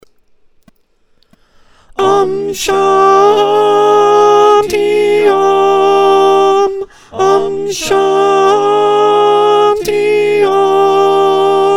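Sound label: soprano, choir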